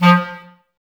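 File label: clarinet, Woodwinds; Sample CDs; Miroslav Vitous; Woodwind Brass Ensemble; Banks W&B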